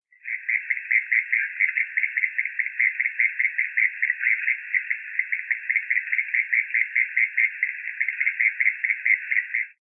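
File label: unknown amphibian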